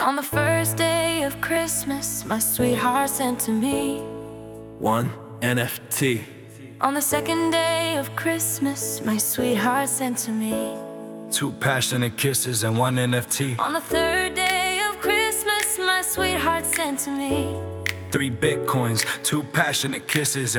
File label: HipHop